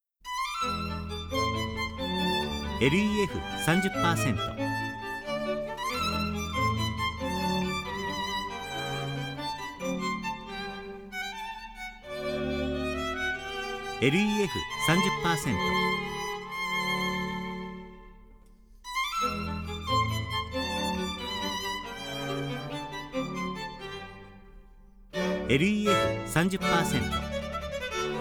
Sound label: strings